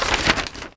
map close

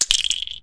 equip jewel

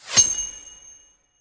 validation number set

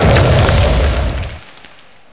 down dust

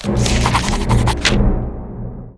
quest receive

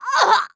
death; Witchblade

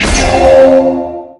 Progress Beep

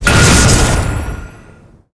War Cry

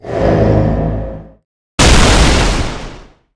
Down Slash

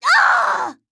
h pain; Witchblade

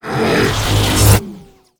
move speed up

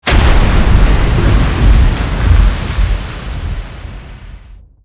Explosion Stair

